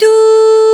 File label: OOOHS, VOX; SC55; East West - Global Sounds